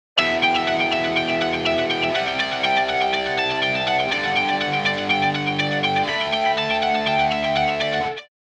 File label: guitar pattern 2 gt